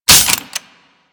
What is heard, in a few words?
Sniper Rifle Reload; Gorg